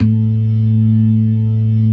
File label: LOW MAN, LOWER MANUAL, Organ; InVision Interactive; Keith Emerson; The Most Dangerous Synth and Organ